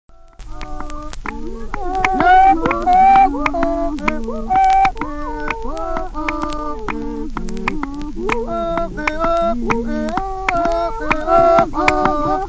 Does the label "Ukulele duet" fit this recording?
No